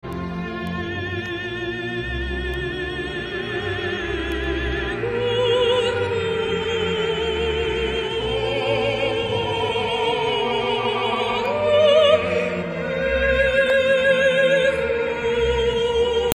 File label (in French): requiem choeur; mozart requiem